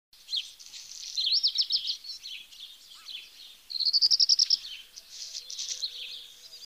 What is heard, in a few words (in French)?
Rouge queue noir